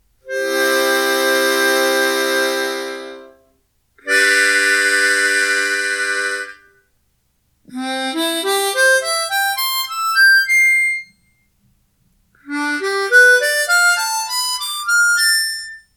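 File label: equal tuning, harmonica; Seydel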